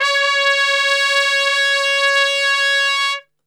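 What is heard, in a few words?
C#4 piccolo trumpet, Brass; Best Service; Brass Super Section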